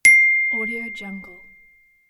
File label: Bell Ding; Wink